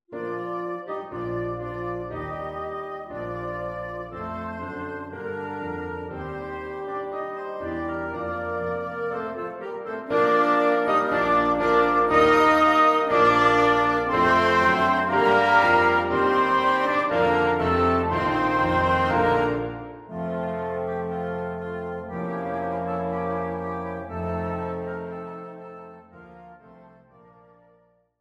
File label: Opening, Haydn Variations arr. for Wind Quintet & Piano